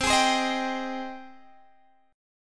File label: C Minor Add Flat 6th C